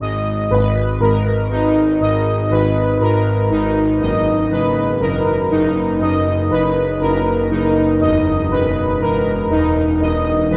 Lead, Spire

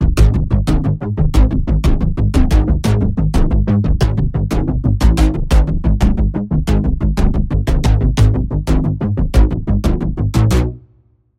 Pulse

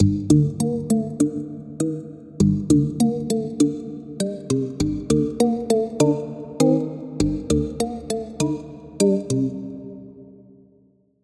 Pluck, Spire; Deprivation; Routed Sound; Spire Expansion; Street Vanquisher Vol.1

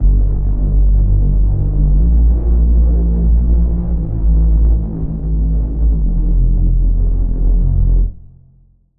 Reese

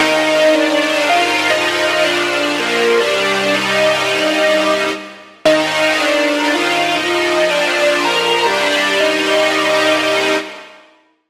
lead; Limbo Area; Routed Sound; Serum 2 Expansion - Flame Summoner Vol.1

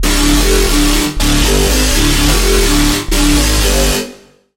Bass; The Monster; Routed Sound; Vital Expansion - Chaos Bringer Vol.1

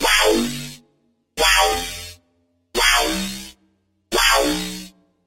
Wobble; Switchblade; Routed Sound; Serum 2 Expansion - Chaos Bringer Vol.1

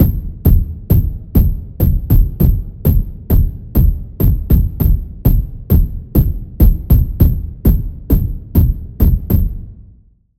Impact - Vibration, Sylenth1; Routed Sound; Sylenth1 Expansion - Quantum Enforcer Vol.1

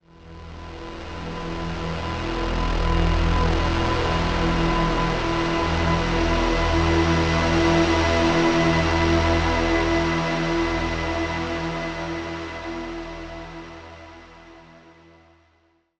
Pad; Routed Sound; Spire Expansion - Doom Carpenter Vol.1

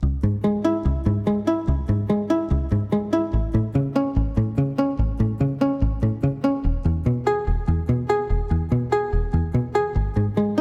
Pluck; Isolated Kind; Routed Sound; Serum 2 Expansion - Flame Summoner Vol.1

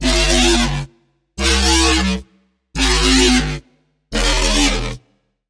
Wobble - Mechanical Vortex; Routed Sound; Serum 2 Expansion - Chaos Bringer Vol.1

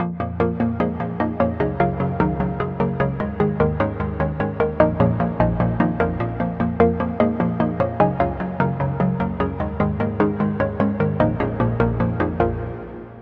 Pluck, Sylenth1

